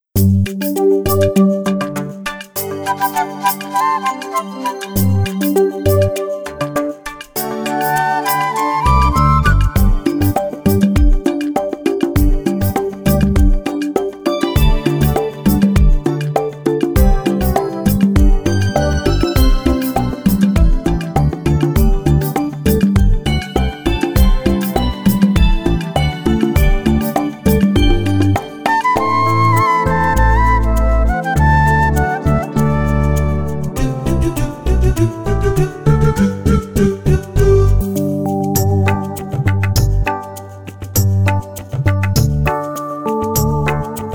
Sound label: KARAOKE